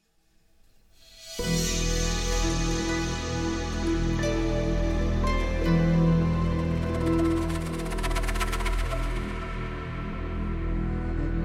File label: magical; forbiddenreach